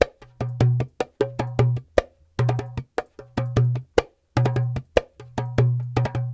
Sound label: Dumbek